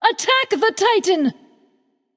vox attack light; smite; hel

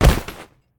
bodyfall; smite; god gilgamesh

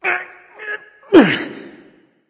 grunt lift; smite; nezha

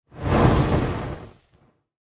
lobby victory burn; smite; god daji skin15

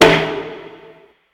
rimshot; smite; god raijin

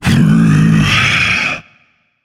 sigh; smite; atlas

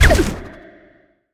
Bow Fire; smite; god cupid skin13

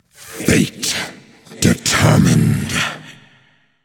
ward placed; smite; janus

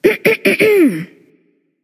clearthroat; charybdis

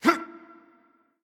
hitreact human male small

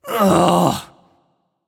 "ugh"; smite; voicepack; janus

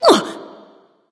grunt hit; smite

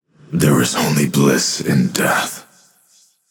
death, voicepack; smite; vamana

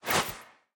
swish small; smite; god artemis

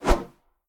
swish; Smite; god Lancelot

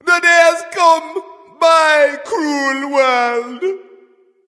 death; smite; voicepack; khepri

